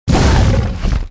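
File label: Ancient Dragon damage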